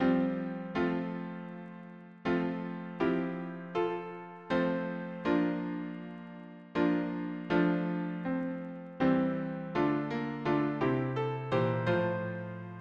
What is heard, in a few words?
harmony piano